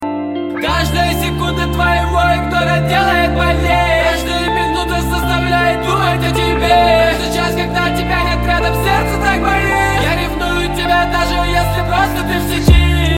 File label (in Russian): gitara